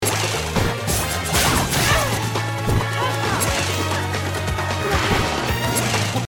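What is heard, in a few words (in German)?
herum hüpfen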